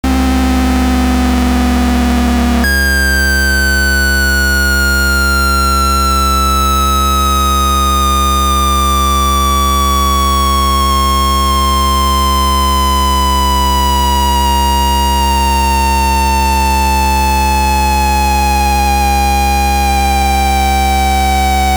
soft sync

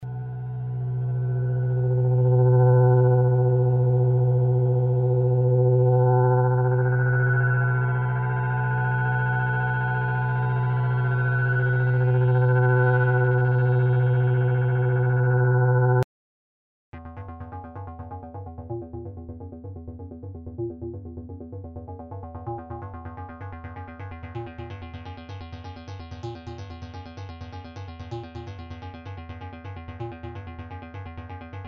Noise

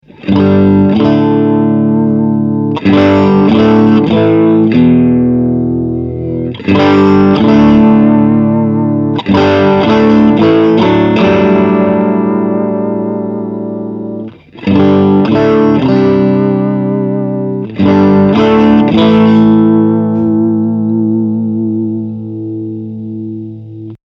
gain 10h

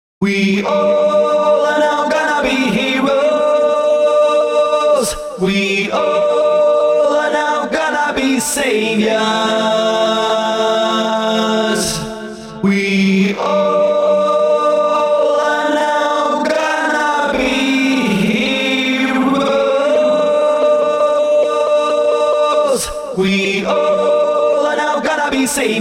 Roland MC-707 Time Stretching

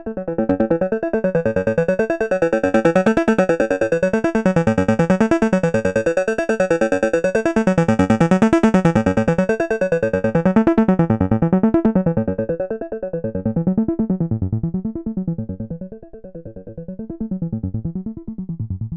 Waldorf Modular Wavetable Patch

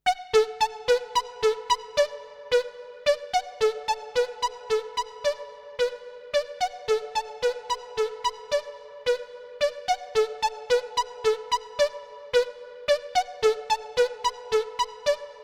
LFO to Panorama 3

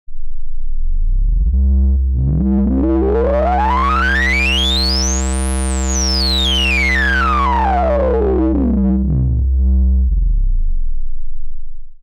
LC MS Reso LPF

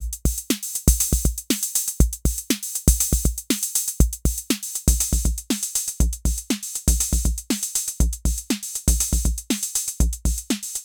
Roland TR-06 Boutique Simple Beat Distortion

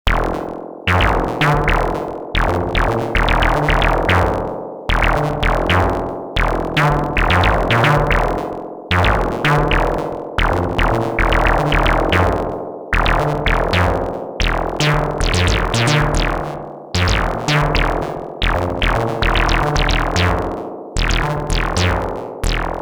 FM Percussion; Treadstone

